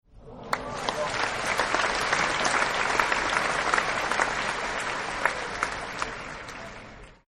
Applause Light; Human

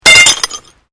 GLASS SHARD SMASH

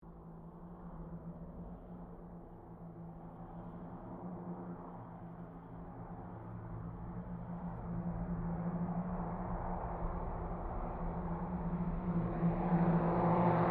CT4 squadron 5 pass, stereo, Squadron of 5 CT4s; Airplane; Vehicles; Aircraft; Aircraft Collection

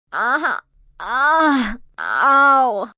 Moan Uh Huh Ow, Moan, groan, female, pain; Groaning; Voice Box

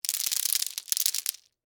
Debris Lima Beans; Texture; Materials

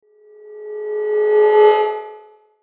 Metallic Tremolo Swell

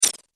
Robotic Menu Click; Robot Sound Effects

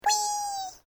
Created Creature Small Ping, Alien Sound Effects

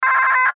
Radio Morse Code; Morse Code Weak Signal Number Four; Morse Code Sound Effects; Blastwave; Warfare

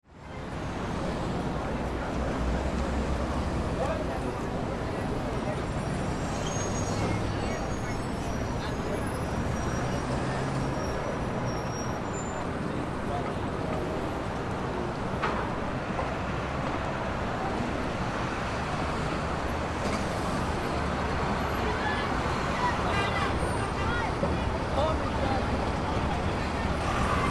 City New York Times Square; City New York Times Square Traffic People Sirens; Ambience; Blastwave

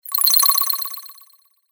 Scifi Sorcery, magic sound effect; Science Fiction Sfx Noises; Andrew Fleming

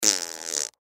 Human Mouth, Mouth Sputter Fart; Blastwave

